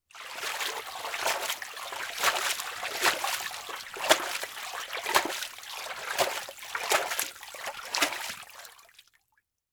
Liquid Water Water Hands Splashing In Water

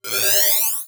Multimedia Internet CD-Rom Flash Hi-Tech

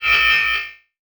Multimedia Internet CD-Rom Flash Wipe Electronic

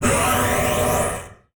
Transition Whoosh Laser; Production Element; Title Transition; AdobeCC

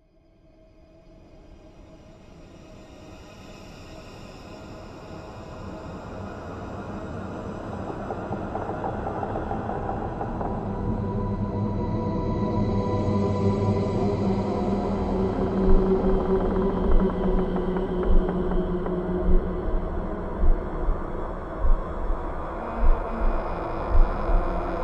Basement Creatures Soundscape 120BPM; Soundscapes

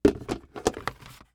Household Bin Open Plastic

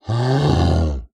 Animal Mammal Carnivore Grizzly Bear Growl; animals; AdobeCC

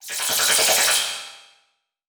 Transition Gurgle Warble; Production Element

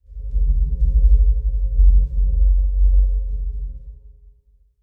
Title Transition Stab Dark Mysterious; Production Element; AdobeCC

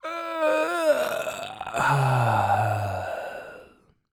Human Male Yawns Stretching

